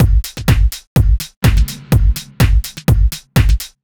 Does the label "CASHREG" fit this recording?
No